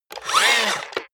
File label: robot movement fast